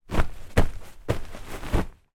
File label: household pillow movement childs